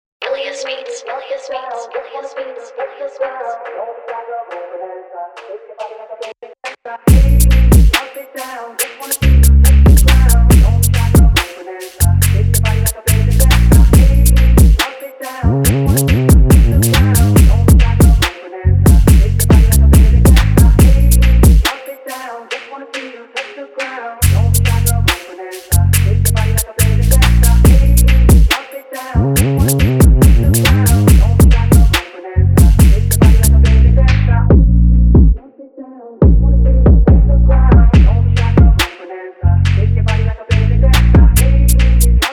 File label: INST 70BPM Bbm